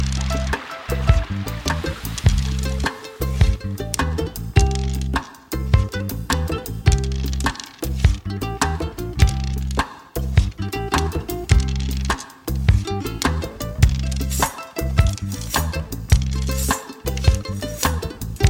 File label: georgian folk